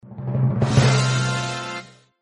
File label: Jingle Win Hand High